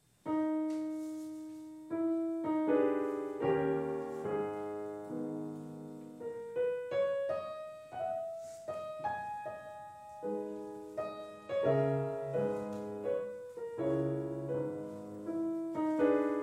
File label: Chopin: Mazurka in G-sharp minor Op 33 No 1, piano; Teen Pianophoria Highlights, July 12, 2013; age 13